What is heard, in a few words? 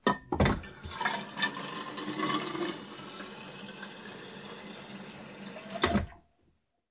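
drawing water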